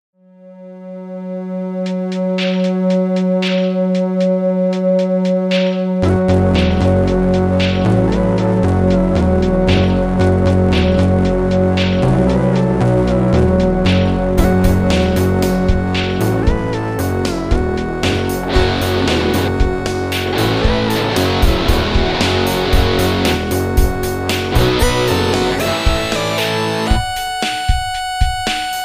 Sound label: industrial